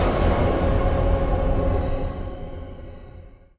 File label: Hi Hit